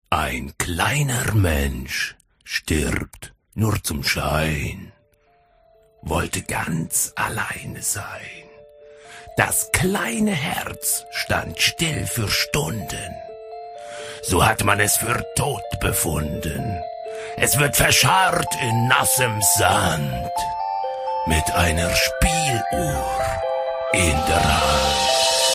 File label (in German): spieluhr